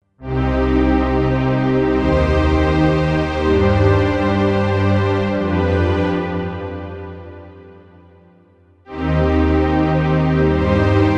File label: Strings Big Strings, synth